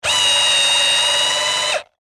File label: Industry Electric Drill Tighten Screw Whine Stress; Drills; Tools